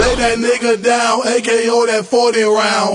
Vocal

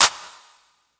clap verb; Claps

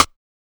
Snares

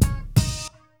HOT LICK

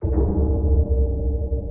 Fold FX

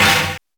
fx one shot hit

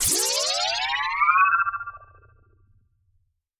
TRAP TRANSITIONZ

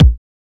kicks

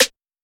Snare, 808; Snares

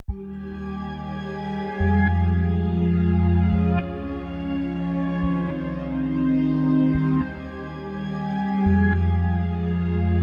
140 Fm, 808; Swarley

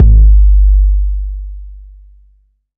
808's

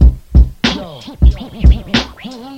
64 Beats

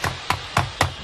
Running

